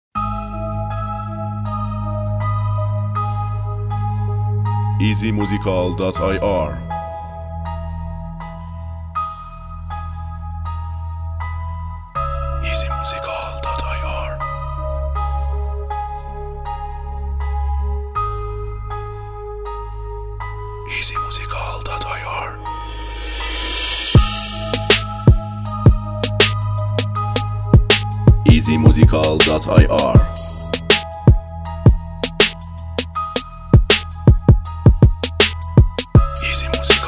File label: Beat